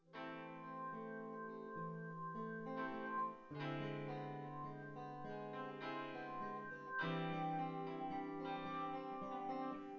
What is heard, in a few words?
nodrums synthconv